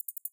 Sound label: count Up Loop; hotline2